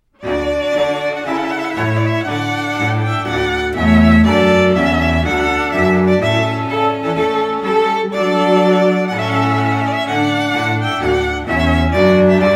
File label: Trumpet Voluntary